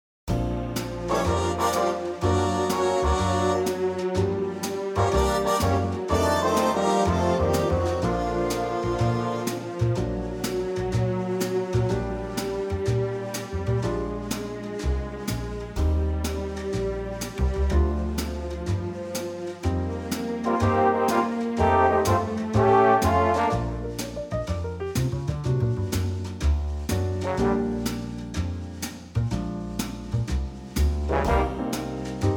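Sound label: Bb Eb, backing track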